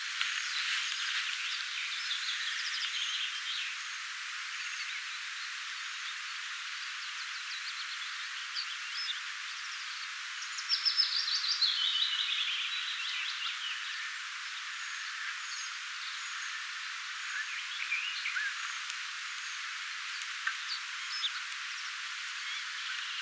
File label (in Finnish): nuori jo itsenaistynyt puukiipijannuori aantelee